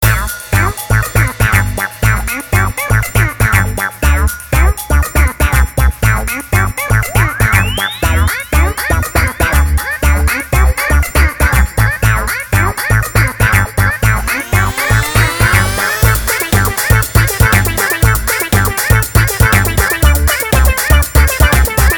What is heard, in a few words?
Bonus Level Theme; Sticky Balls; Gizmondo, iOS